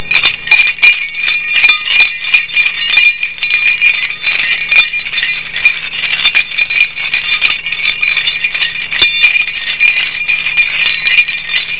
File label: HAULING, MACHINES